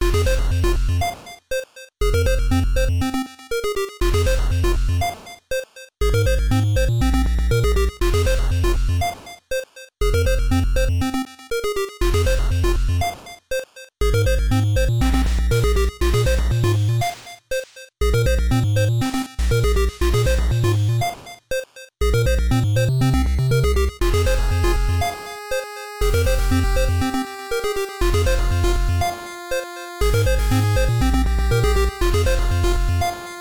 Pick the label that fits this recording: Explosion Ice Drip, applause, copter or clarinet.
copter